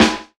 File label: SNARE; E-Lab; X Static Goldmine 2